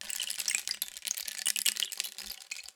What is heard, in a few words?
Rain Stick, Percussion Samples